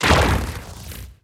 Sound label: bounce; carrionqueen